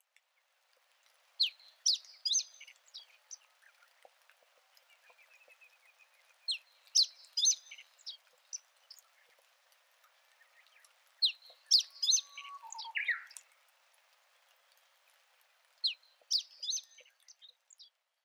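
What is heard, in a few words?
Chestnut-eared Bunting, nozori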